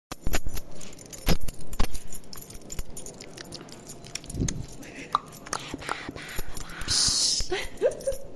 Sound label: asmr